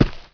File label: Knifethrower Feet; Playable Tutorial Level; TR2